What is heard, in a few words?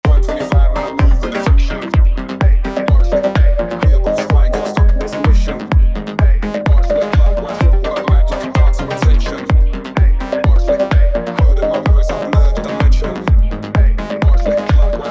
127 kick bass atm Am WET